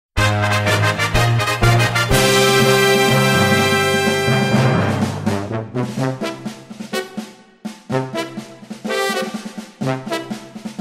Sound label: Victory Parade; smbx38a; The Great SMBX 38A Contest I; Level 2-7